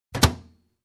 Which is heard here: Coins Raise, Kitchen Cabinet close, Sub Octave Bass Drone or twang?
Kitchen Cabinet close